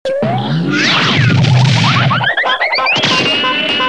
Sons cartoon deguerpir; bruitage

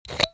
Ouverture canette; bruitage; Liquides

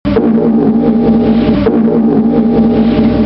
Champ magnétique

